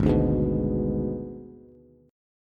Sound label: Ab Diminished Ab Bass; Abdim strummed; JGuitar